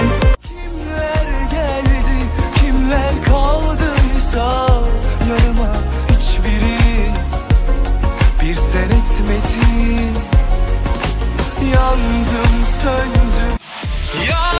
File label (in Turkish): guzel turk zil sesi